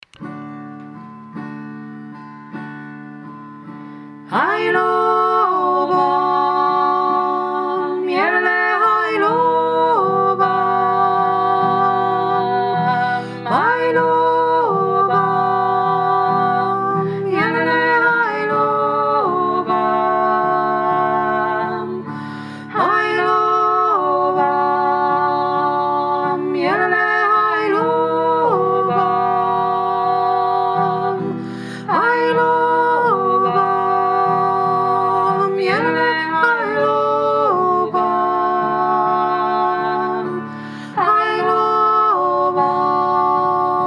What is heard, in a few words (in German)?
heilobam 2 stimmen